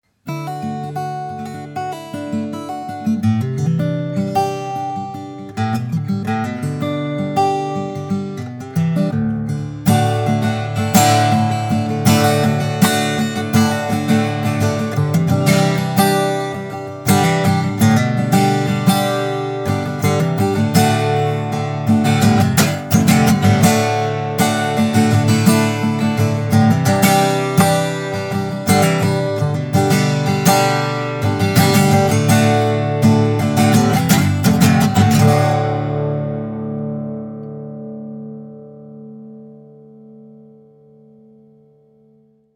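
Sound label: Guitar Sample 17d, Vintage Prewar Guitars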